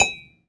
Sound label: teacup